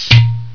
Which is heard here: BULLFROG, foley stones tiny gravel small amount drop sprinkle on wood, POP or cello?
POP